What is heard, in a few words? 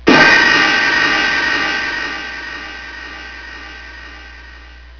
BONG